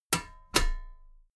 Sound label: metal ladder step heavy double